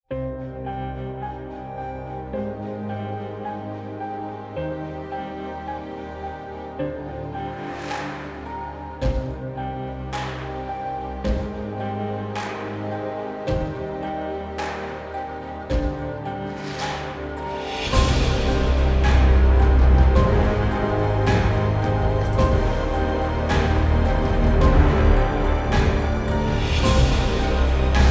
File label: Trailer Brass; Musical Sampling